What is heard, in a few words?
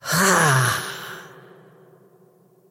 wolf attack emote; dota; crystalmaiden